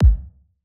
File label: kick